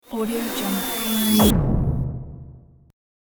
horror snap impact

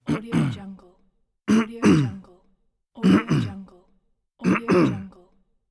man coughing

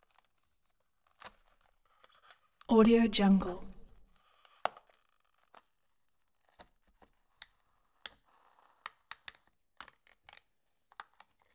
ice breaking; collanderson

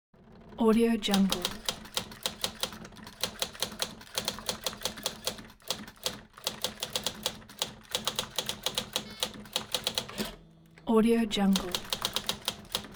typewriter

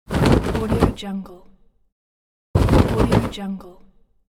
clothes rustle

